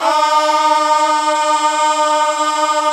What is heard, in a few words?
GOSP. AAAHH; Sound & Vision - Gigapack II CD 1